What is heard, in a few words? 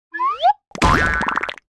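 attack; hero sound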